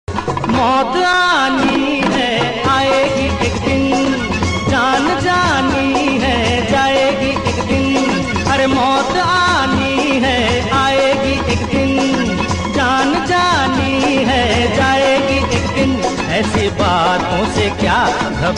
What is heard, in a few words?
bollywood hindi song